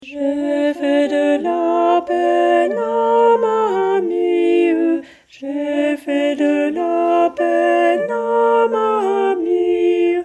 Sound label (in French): Le Doux Chagrin Altos